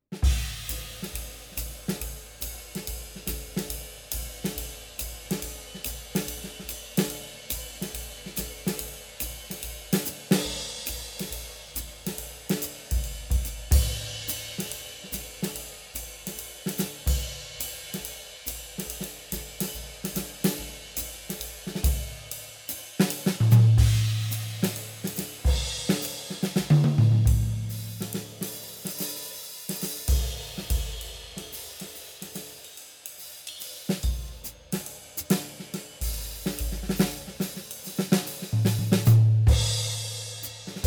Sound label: Bosphorus Hammer